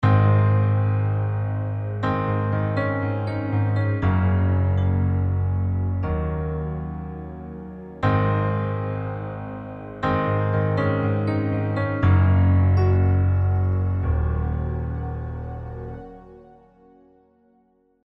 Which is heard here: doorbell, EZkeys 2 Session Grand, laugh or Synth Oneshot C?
EZkeys 2 Session Grand